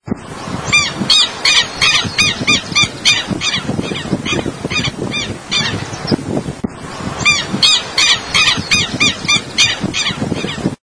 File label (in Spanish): voz tero común